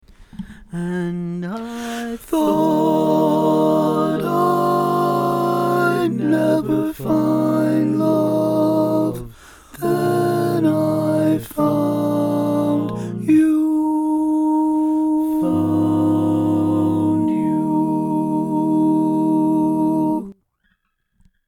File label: Tenor, barbershop